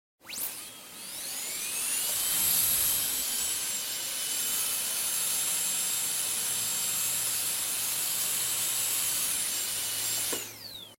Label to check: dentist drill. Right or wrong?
Right